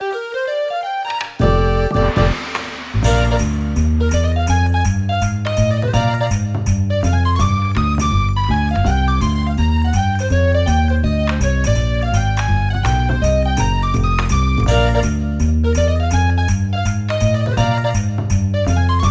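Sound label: BGM Salon Result Win China; clubhouse games 51 worldwide classics 2020 switch gamerip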